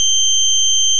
timevarying high blit, nonlinear phase oscillators; dafx12 nonlinear phase oscillators